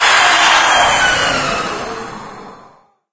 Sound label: death, lostsoul; doom3